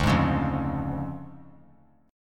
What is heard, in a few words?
D Minor Major 7th Flat 5th, D; 14,15,16,15; DmM7b5 strummed; JGuitar